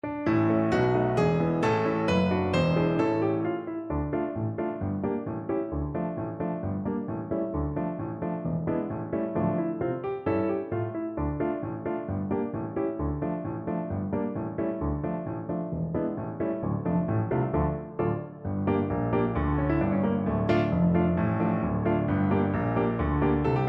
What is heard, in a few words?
Bassoon